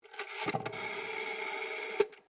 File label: TELEPHONE OLD ROTARY BAKELITE DIAL; Bakelight phone; Telephones; Electronics